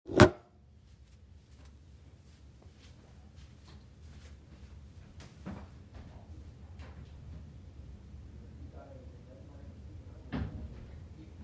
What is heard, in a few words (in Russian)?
seriya udarov po bochke